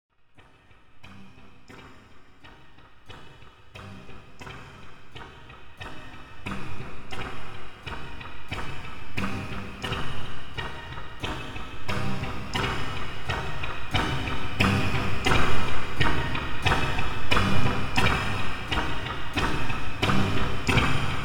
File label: march